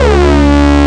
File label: SYNCHRO; East West - Global Sounds; THE CAT